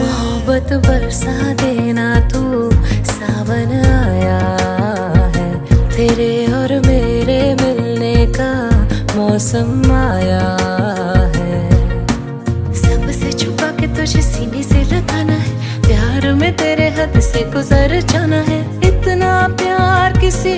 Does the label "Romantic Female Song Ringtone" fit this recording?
Yes